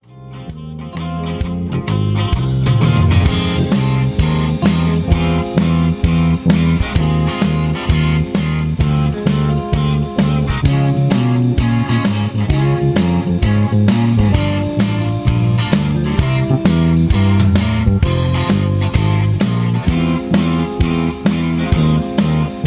16 bar F Minor Blues Walk Down

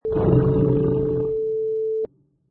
Tiger Growl

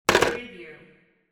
phone hang up

